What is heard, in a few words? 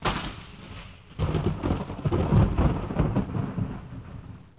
thunder; Pirates Of The Caribbean